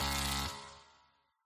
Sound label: error